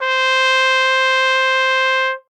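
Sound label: trumpet C hi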